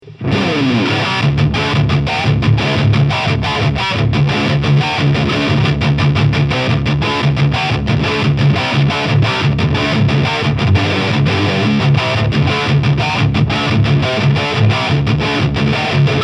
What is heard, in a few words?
Harley Benton R 446 Blue Metallic High Gain